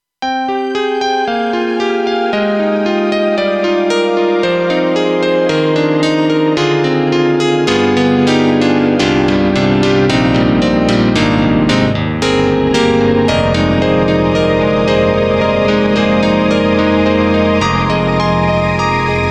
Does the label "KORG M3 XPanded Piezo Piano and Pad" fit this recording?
Yes